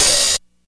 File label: soft open high hat d key, Royality free open hi hat sample tuned to the D note